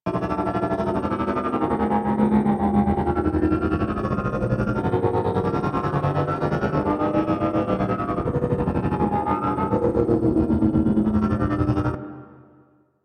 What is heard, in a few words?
Mine Osprey Engine Loop